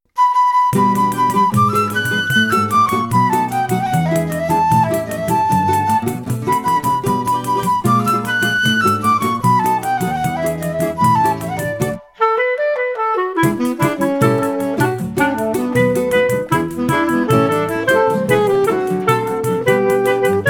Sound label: songbook instrumental